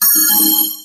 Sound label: coin created